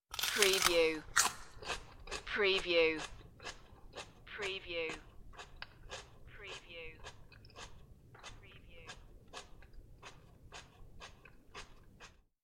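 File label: Chewing Celery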